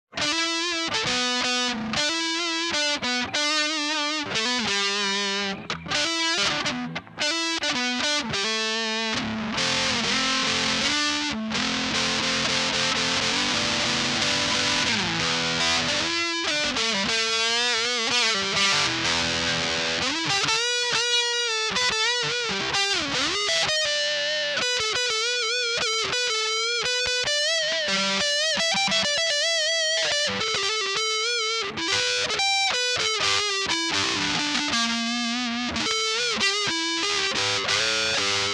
guitar play music; gamerip; The Sims 2

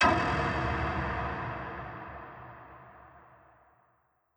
Dracosoldier Shield

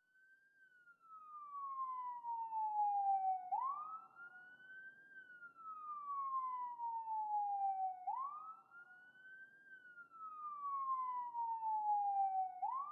Siren Police; dawn of the monsters gamerip 2022

